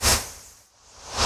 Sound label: Needle Throw And Return